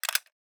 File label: Metallic Gear